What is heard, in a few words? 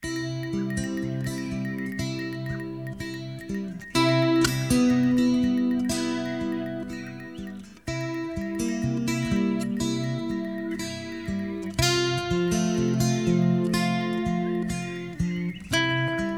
Pipes And Drums